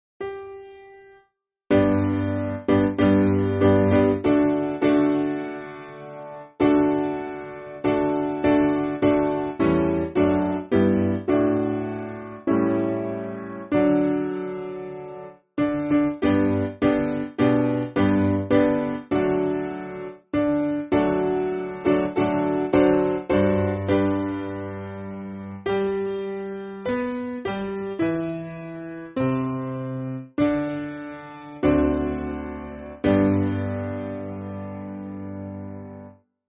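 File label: Piano, Backing Files for Churches; Gloria; Meineke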